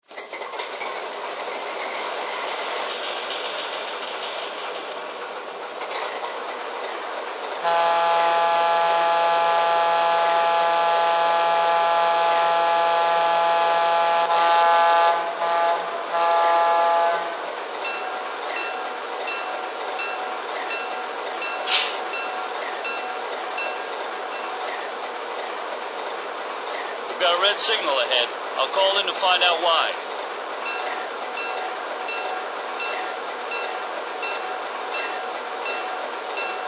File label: RS1